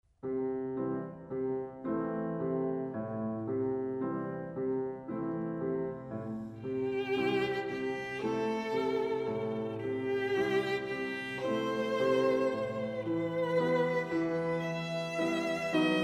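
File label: violin sonata; franck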